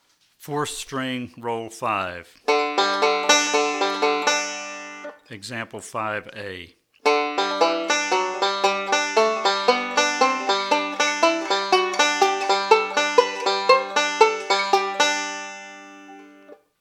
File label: Rolls For Melody On 4th String